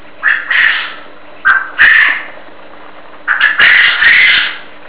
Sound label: japonica